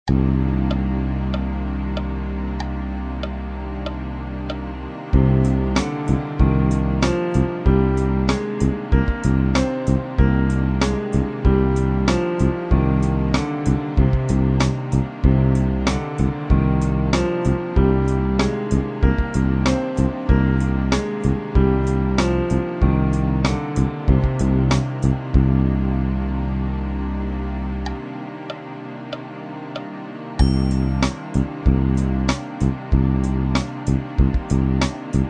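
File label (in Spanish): eólico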